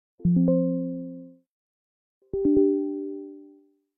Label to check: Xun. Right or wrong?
Wrong